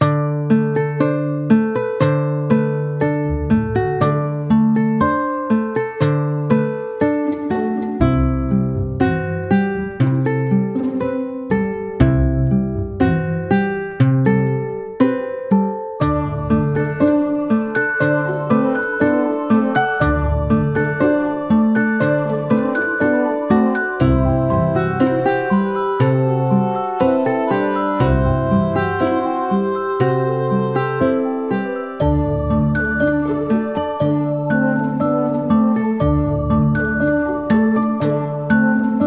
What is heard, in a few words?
Bonuslevel